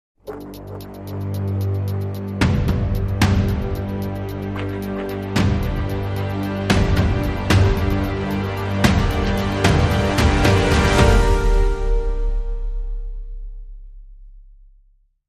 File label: Dramatic Sting Less Cymbals